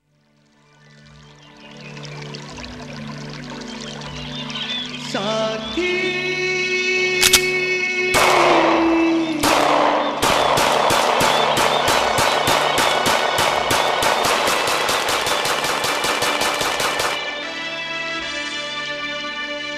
Guns Sound

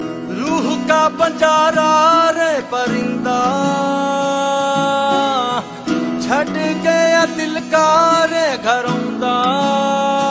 Male

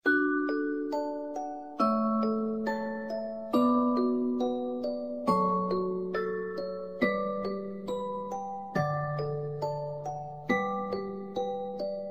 Music Box Canon

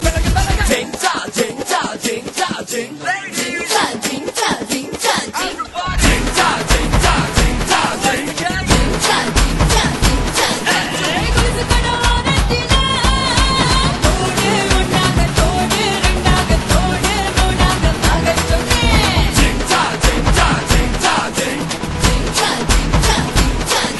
Malaysia Tamil

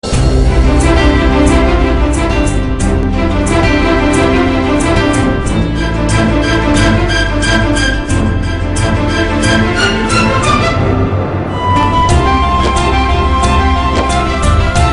Sad Version

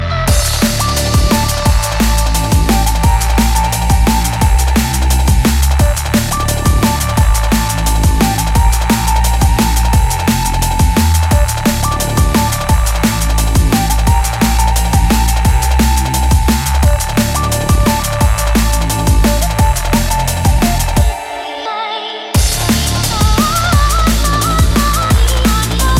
Drum N Bass

